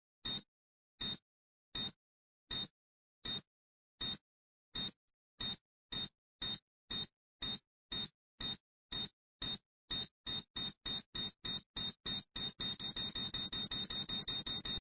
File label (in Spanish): bomba